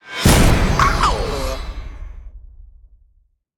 scatter hit

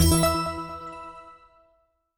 win Sound; americanroulette3

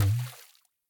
reel Stop Boing; strollingstaxx

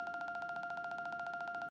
countUpLoop

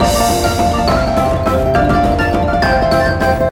big Win Loop; wild turkey

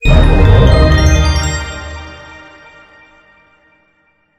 large Win Tonal Eb; fairymirror

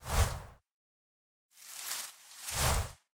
free Spins Pop Up; fruitshopmegaways

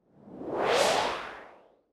Coin Fly; godsoffortune

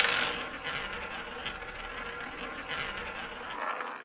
ball spin, roulette

